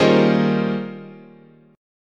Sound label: D sharp 13th, guitar chord